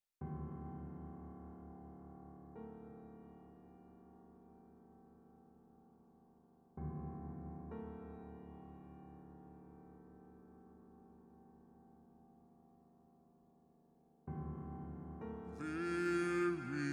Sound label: Tenor en piano, Ballad reverb